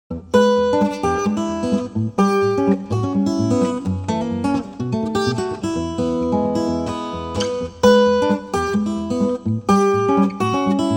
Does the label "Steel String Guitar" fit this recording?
Yes